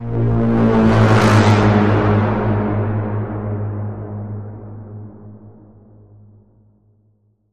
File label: Bend, A sharp